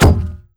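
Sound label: action fire extinguisher hit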